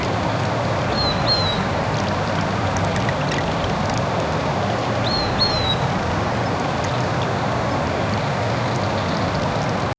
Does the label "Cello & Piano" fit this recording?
No